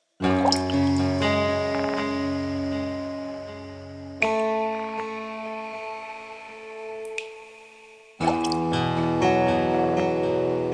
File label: Exploration; Poopdie Chapter One